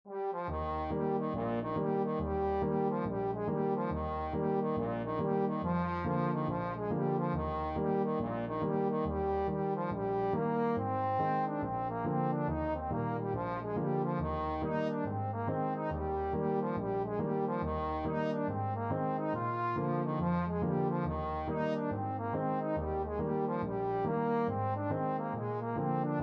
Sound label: miss mcleod, trombone